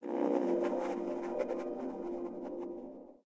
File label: station; ruina